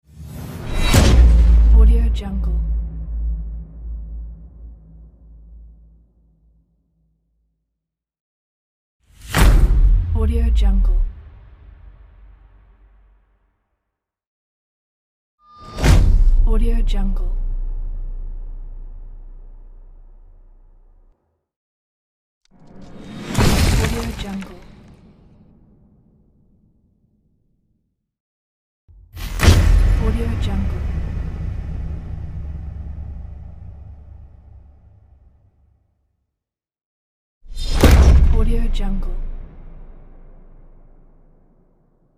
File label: impact